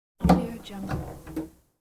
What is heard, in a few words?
cupboard door open